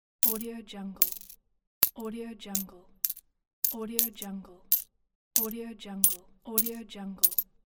counting coins and change

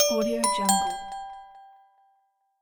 upgrade; dulebas

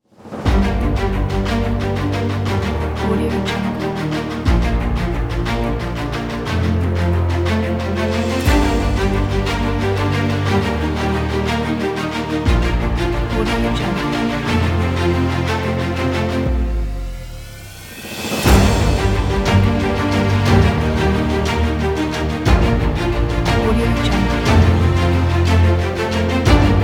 motivational epic pack

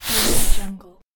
fast smooth minimal transition

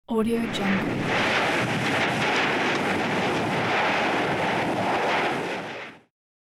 lava spewing from volcano vent

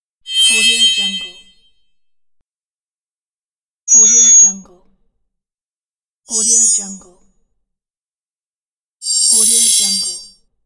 shrilling

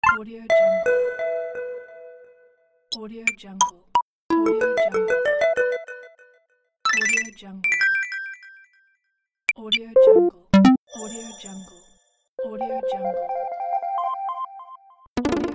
interface pack

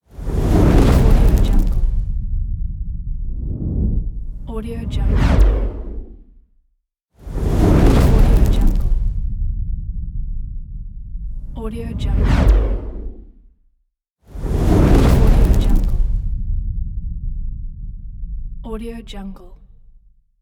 fire explosion logo reveal

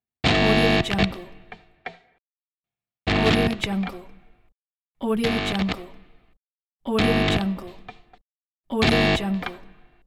guitar plug into amplifier; moonduststudio

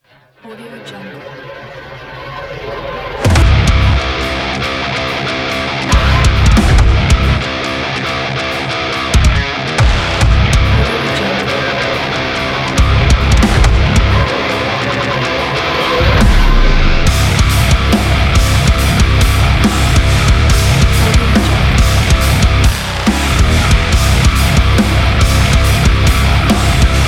industrial action metal trailer; tonytape

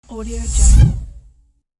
technology transition whoosh

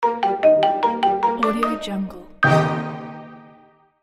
cartoon music finish

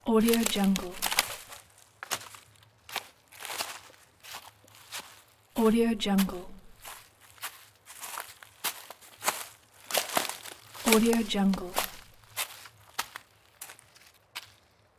walking; arcticroman

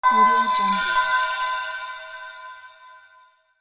introduction of bell

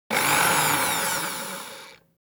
scifi mechanics servo rev up short

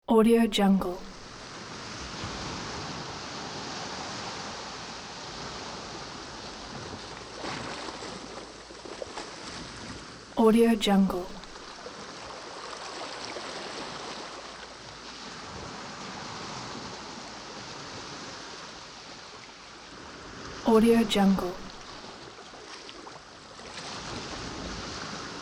calm ocean waves

